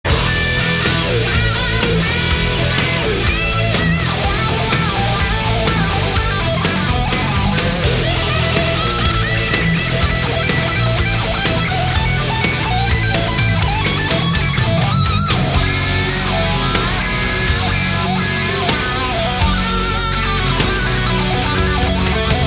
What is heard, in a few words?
Solo